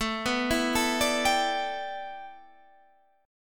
A 7th Suspended 2nd Suspended 4th Guitar Half Step Down